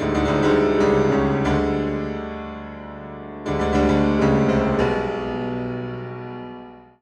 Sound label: Piano Secret; darkenstein 3d